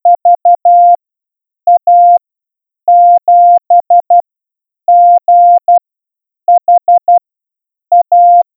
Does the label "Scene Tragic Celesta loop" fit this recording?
No